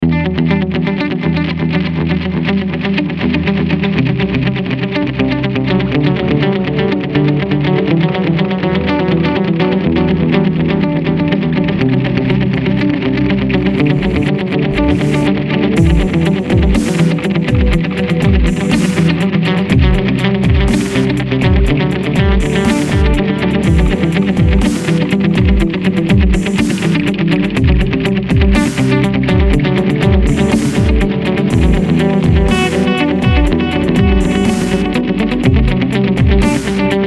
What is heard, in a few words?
guitar chillstep